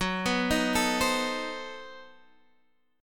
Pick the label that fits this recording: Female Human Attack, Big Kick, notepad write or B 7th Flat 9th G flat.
B 7th Flat 9th G flat